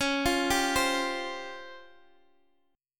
C sharp Major 7th Flat 5th Guitar Open G

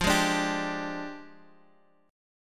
F Minor 6th Add 9th, Guitar Half Step Down

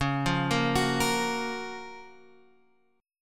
Gb Major 7th Db, guitar

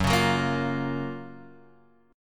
Gb Major 7th, down, Guitar, Open F; strummed; JGuitar

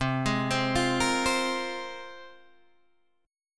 C 7th Suspended 4th Sharp 5th, Guitar, DADGAD

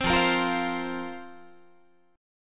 F Suspended 4th F, Guitar; Fsus4; strummed

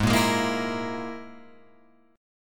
Gsharp Suspended 2nd Sharp 5th, Guitar, Open F; G#sus2#5; strummed; JGuitar

